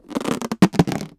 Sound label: Balloon, cartoon Foley Fx; musicradar; cartoon caper